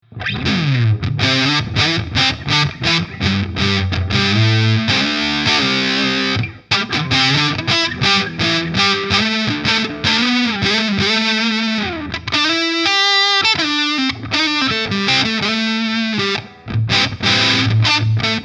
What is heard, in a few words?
fuzz; MOD Devices MOD Duo